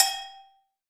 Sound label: AGOGO LW; EdgeSounds; Drum Mashines; R-8 DRUMS